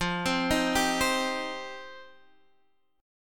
F 7th Suspended 2nd F down Guitar Drop D

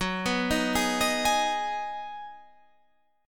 B 6th F sharp, guitar chord

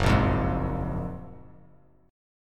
Gb Major 9th, down, Bass Standard

